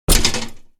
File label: metal plate rattle hit